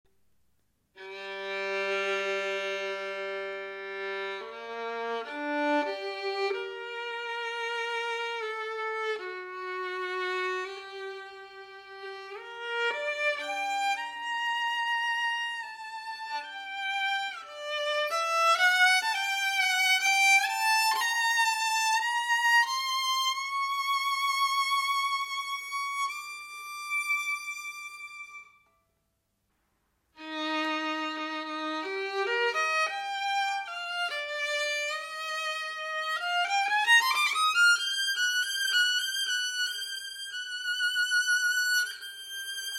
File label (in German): Strahlende Höhen farbenreich stark